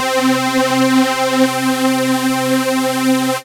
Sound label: Synths Note C